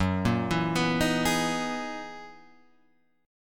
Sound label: F sharp 13th F sharp; arpeggiated; JGuitar